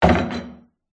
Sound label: pub wooden bar stool set down on wood surface; field reports